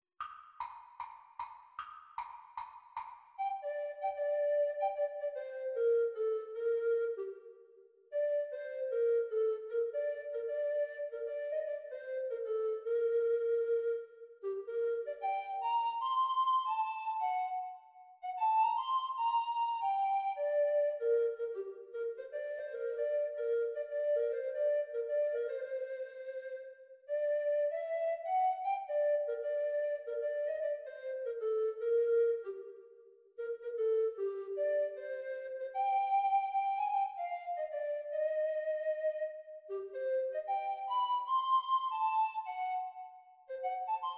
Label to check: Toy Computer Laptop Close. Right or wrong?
Wrong